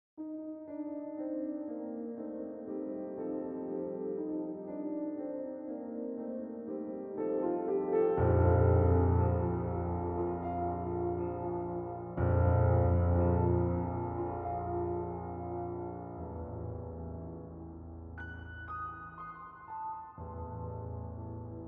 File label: 960L Large Church Mini Grand; Redline